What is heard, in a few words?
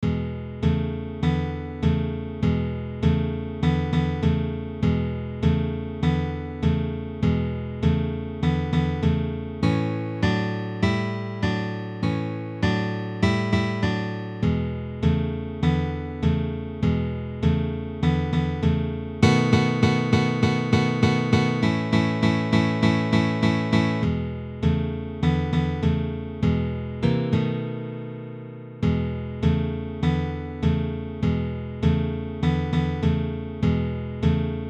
The Harmony Shuffle, guitar